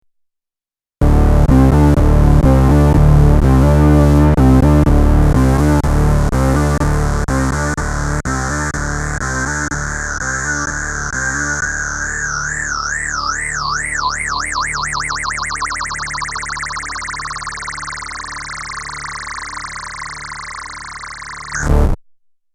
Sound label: Electro Harmonix Bass Mono Synth; 11 Oblivion